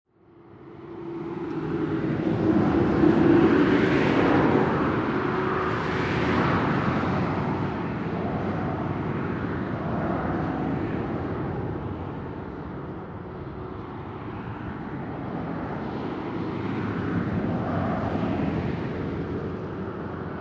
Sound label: Highway Traffic Heard From Inside; Perspective inside a building with highway, freeway or motorway just outsi; Traffic And Car Sound Effects